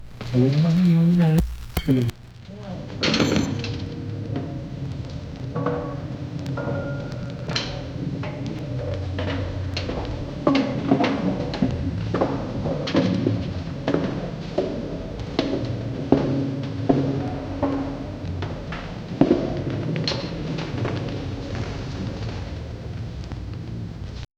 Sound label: creepy ambience in an aba, hospital